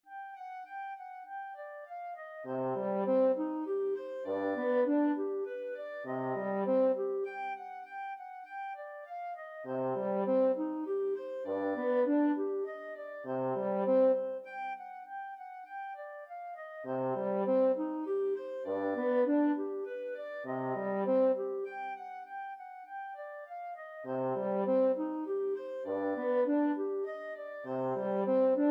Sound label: clarinet horn, clarinet-french horn duet